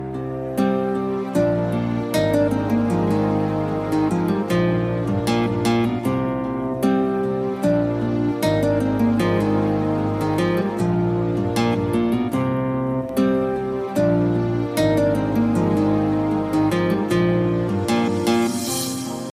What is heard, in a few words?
piano ringtone